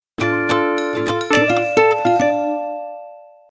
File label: Jingle RATP